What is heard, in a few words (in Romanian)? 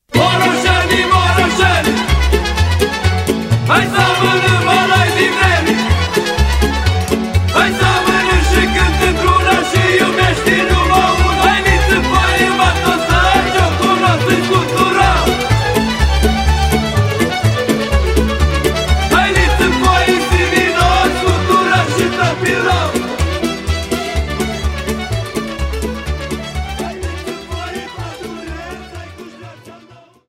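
Suita de Jocuri populare din Bucovina, Roumanie; Morosanca, Chiparusa, Batuta de la Horodnic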